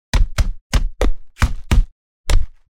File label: Punch Sound Effects